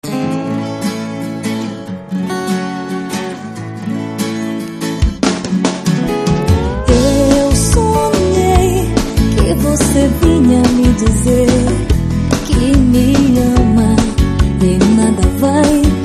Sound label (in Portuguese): acustico